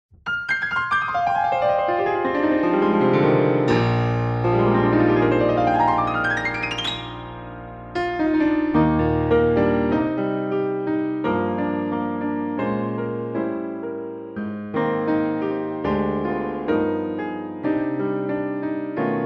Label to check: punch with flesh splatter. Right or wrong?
Wrong